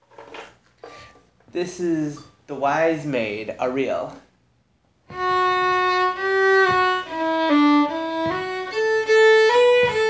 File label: Fiddle; The Wise Maid